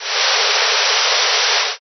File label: hair dryer